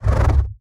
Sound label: damage hits explosions, Warships; World Of Warships